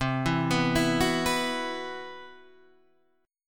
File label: C Major Sharp 11th, Guitar Half Step Down; CM#11